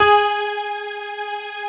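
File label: synth G#, STAB, House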